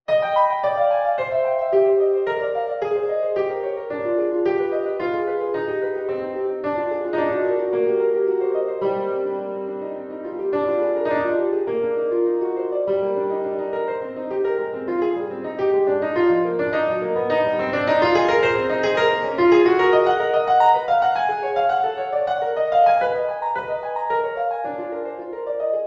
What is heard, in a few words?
piano prelude